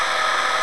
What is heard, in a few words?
flap; PC Gamer IT CD 41; X-System 4.65